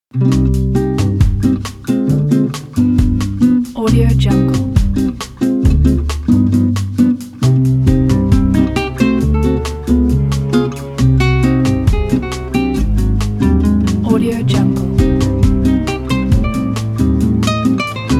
bossa boulevard

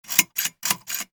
lamp switch pull chain twice

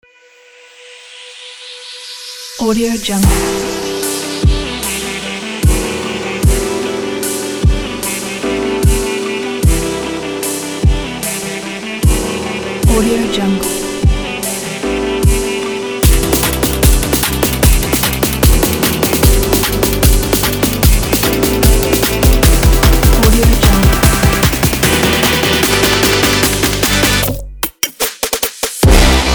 slow motion sport dubstep

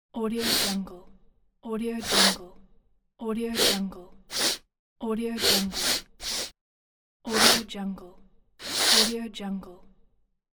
sniff nose

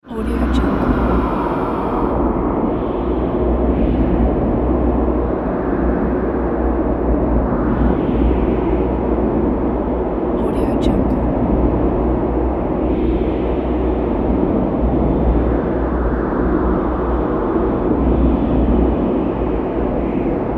horror noise

